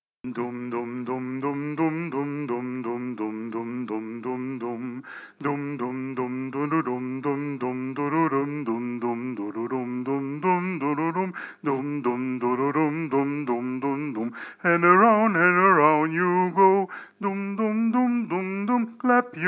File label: Hanuka Dance alto chanté